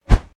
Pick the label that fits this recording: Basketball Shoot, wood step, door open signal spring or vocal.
Basketball Shoot